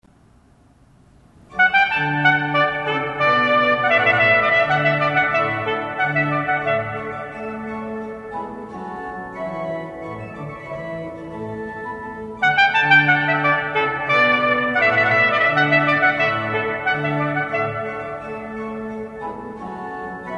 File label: Bourree